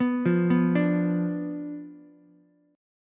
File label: B Diminished B down Ukulele; Bdim arpeggiated